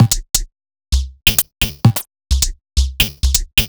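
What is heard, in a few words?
Odd Glitch, 130 BPM, Percussion; Sonic Foundry (Sony Creative Software); Underground UK House & Electro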